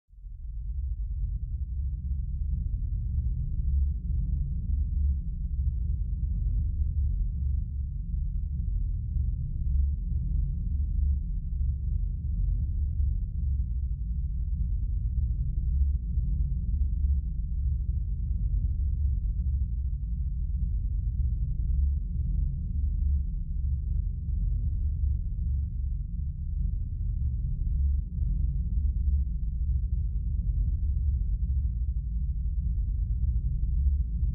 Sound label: Percussion; ProScores